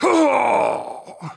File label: death; Counter Strike Model Pack; CS:GO; phoenix coop